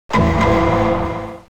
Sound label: Doink Doink; Law and Order